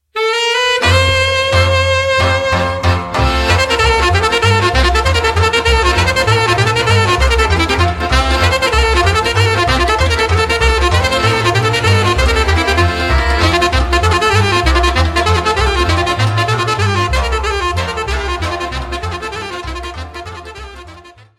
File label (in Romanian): Sirba, Roumanie; Sirba 7; Breaza; Braul pe sase